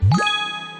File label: fish Death Gold